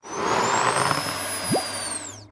Vaccum Drop; Rabbids Coding

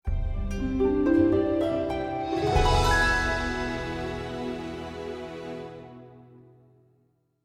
Hero Door; LittleBigPlanet 3; PlayStation 3

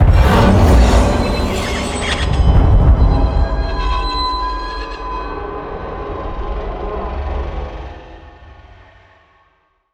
Round End

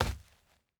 dirt land; teardown; ps5 windows xbox series xs

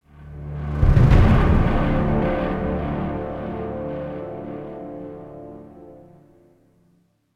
Action Low Clear; The Dark Knight Rises; Android, iOS

